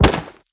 defuser impact; physics; weapons

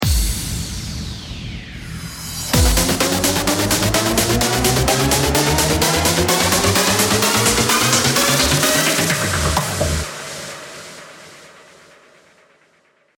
bomb ten sec count

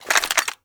mac10 draw, weapons; Counter Strike Model Pack; CS2

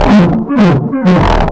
strider pain; Half Life 2

